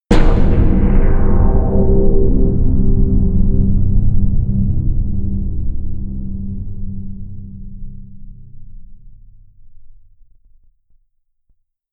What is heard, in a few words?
crush